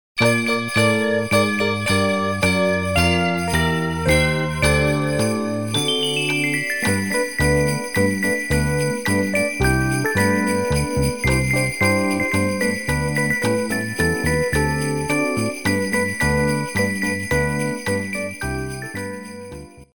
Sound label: jingle bells